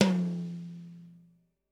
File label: Kit, breakcore Drum Hits